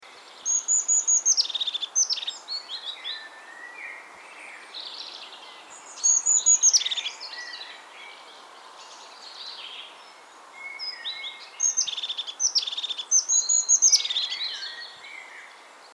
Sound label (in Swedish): Tofsmes